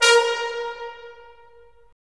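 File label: brass; Roland - LA Composer vol. 3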